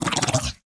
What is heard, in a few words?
zombie damage, monster